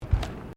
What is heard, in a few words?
Footsteps Bare feet Wooden Deck Step; Footstep Foley; Footsteps (Sound Effects:Human)